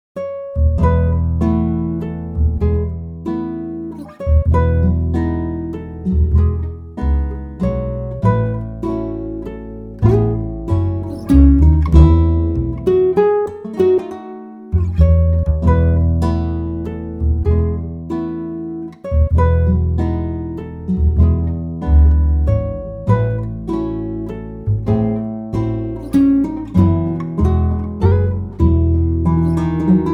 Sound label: relaxing